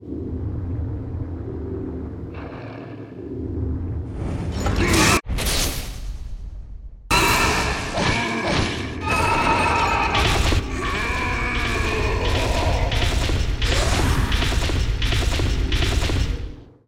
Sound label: Appear